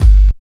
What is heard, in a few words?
NOISE KIK; East West; Phat & Phunky